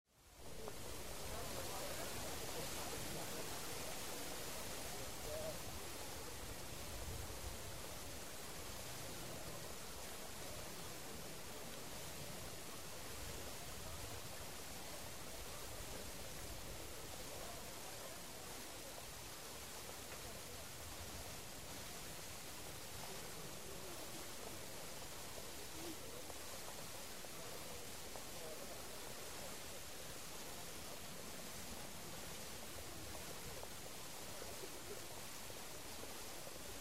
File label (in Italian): fontana esterno sound effect; unicredit; 5-30m low